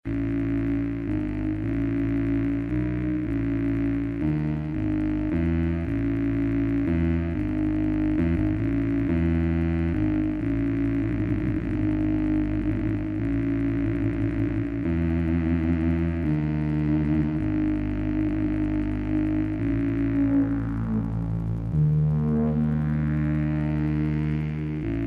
Midimelodie

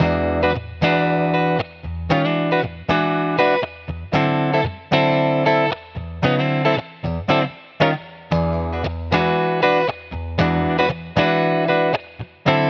Gitarren Plug ins im Vergleich Logic Amp Designer Warm Clean

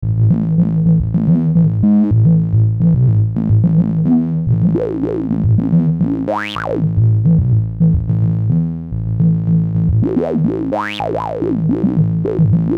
dunkel bass dyn

